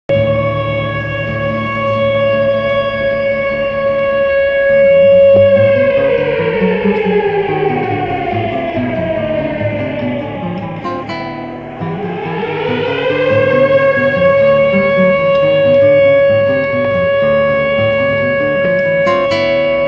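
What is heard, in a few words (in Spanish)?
Alarma de bombardeo en París, Música para teatro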